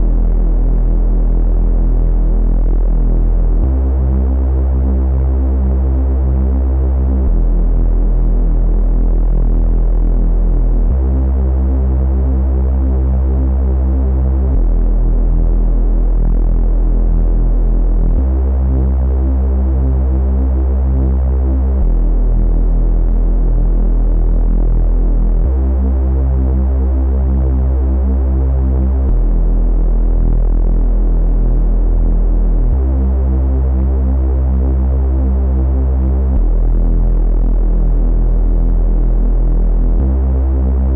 132 BPM E Min Bass; Melody Loops; Ambient; Endless; Cymatics; ANALOG EVOLUTION Beta; Ambient Collection

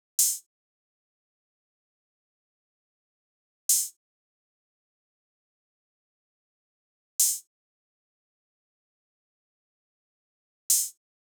Drum Loop 137 BPM Open Hihat; Loop Stems; Cymatics; Mystery Pack Vol 4; Cage Fight

